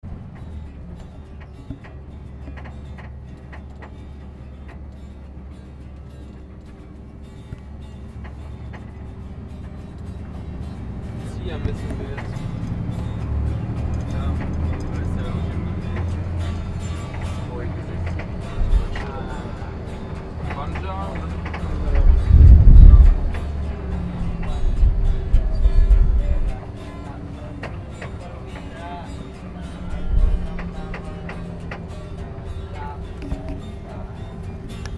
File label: ambience car, Indonesia